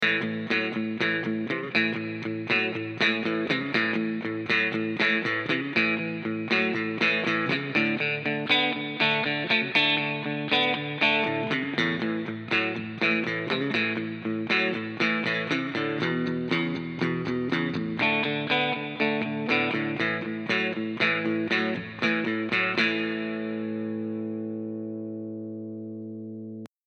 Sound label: rock n roll default; ik multimedia amplitube 4